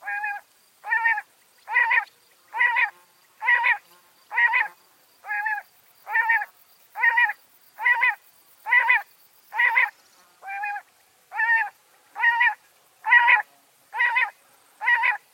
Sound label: Birds Voice Ringtone